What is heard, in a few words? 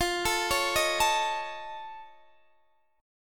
F Augmented 7th F Guitar Standard, chord; F+7 arpeggiated; x,20,19,18,16,17; JGuitar